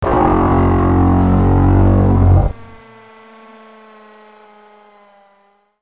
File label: DYING COW, Synth FX Misc